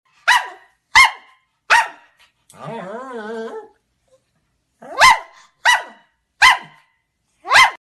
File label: pekingese sound